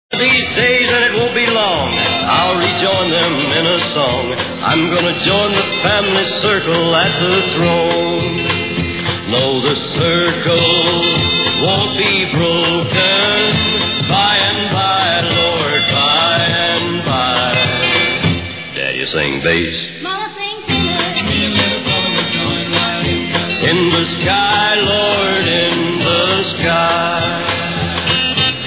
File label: dad bass